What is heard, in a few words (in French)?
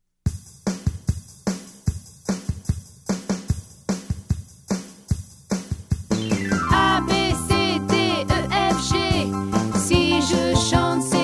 L'Alphabet Chanson